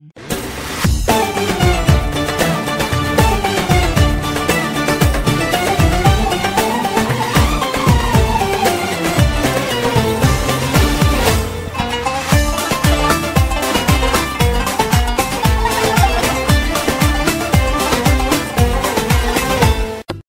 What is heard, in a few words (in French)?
Sonnerie Arabe